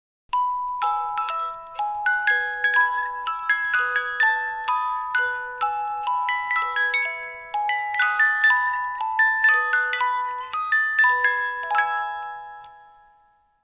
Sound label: o christmas tree, musicbox